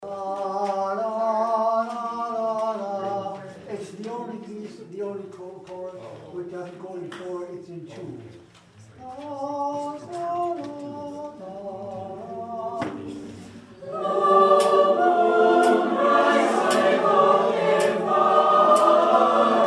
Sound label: bwv10 chorale